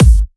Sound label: 128BPM Brazil Kick; Vengeance Samples; Brazil 1 Kit